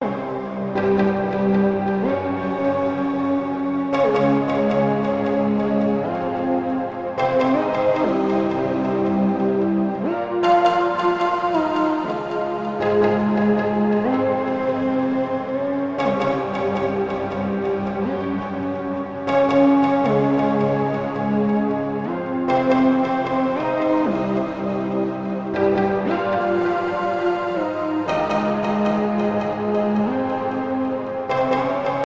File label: Movie Background